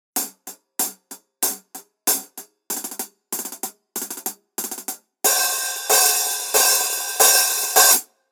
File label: Resonant Hihat 13 Rock